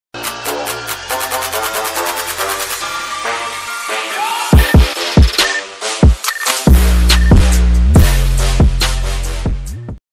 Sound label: Intro music with bass